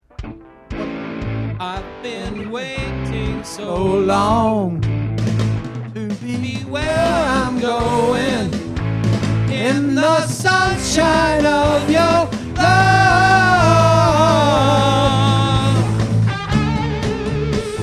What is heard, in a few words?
vocals